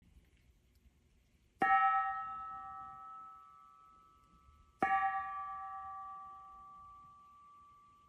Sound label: Gong, serenity earth curved gong flower of life 15cm; 6 black edge